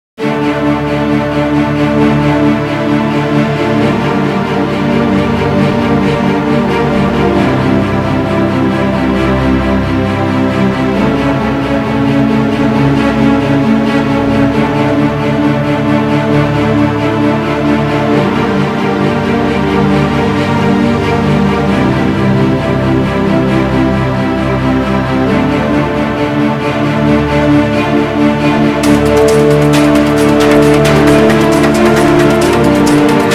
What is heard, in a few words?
ambience samurai; cstrike; zombie plague